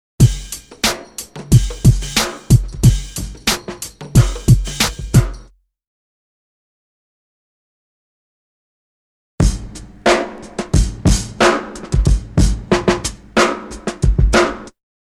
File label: Drum loop; BEST OF DRUM BREAKS 30